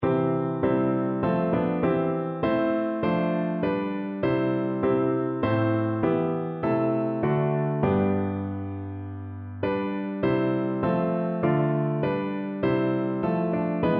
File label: o god our help, piano